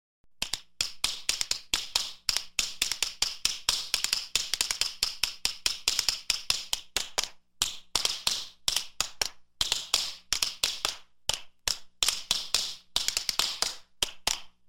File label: Tap Dancing Sound